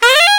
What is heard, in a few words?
sax; East West; Global Sounds